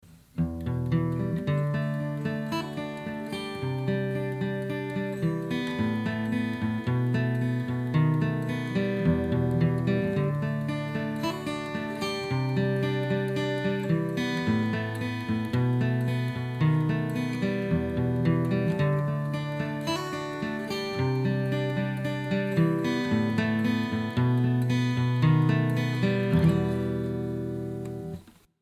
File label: Acoustic